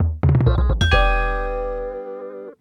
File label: Lucky Drum Prize